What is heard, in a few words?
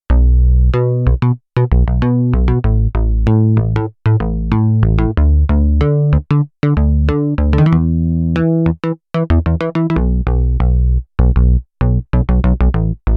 Pulse Bass, Korg Radias-R